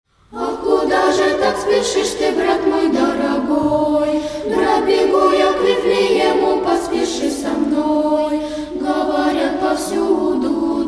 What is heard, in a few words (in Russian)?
Рождественские колядки